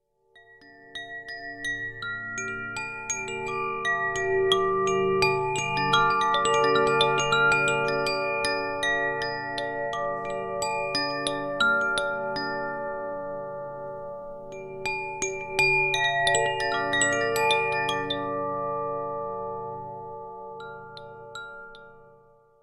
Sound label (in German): klangspiel zaphir sufi